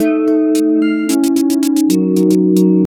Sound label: overall tone pleasant, minimalist